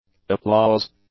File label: applause; nouns